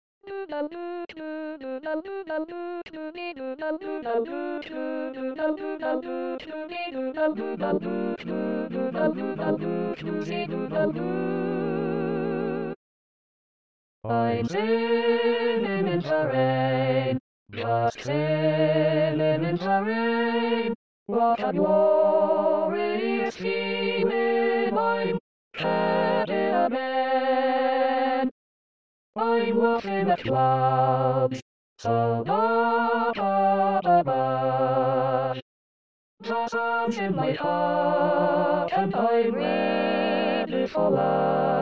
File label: All SATB Eb